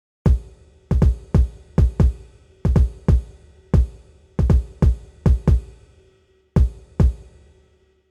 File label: kick no